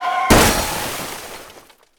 crash; milebymile

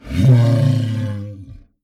bear warn; chaosbear

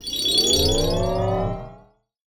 statup; battle

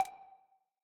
takepoint

